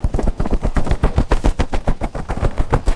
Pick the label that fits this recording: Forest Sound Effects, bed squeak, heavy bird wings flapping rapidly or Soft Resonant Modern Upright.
heavy bird wings flapping rapidly